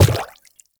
Inf Quadruped Medium Walk Water, Infested, Footsteps; Warframe